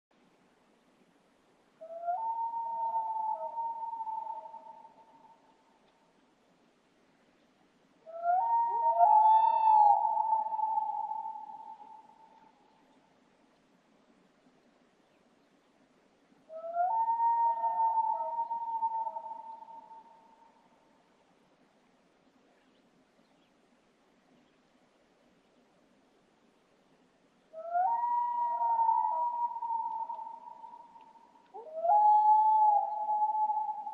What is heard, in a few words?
wail